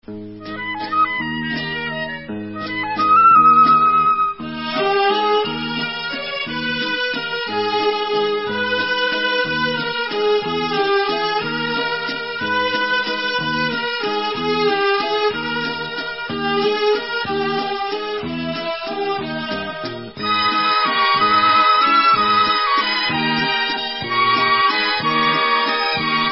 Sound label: Etz Harimon, Folk, Instrumental